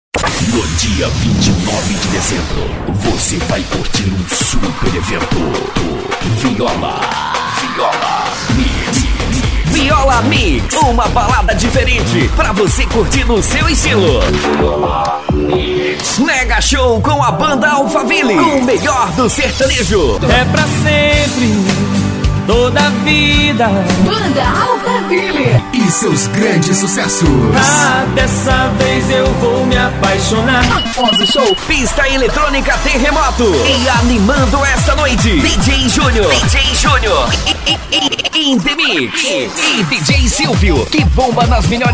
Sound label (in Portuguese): viola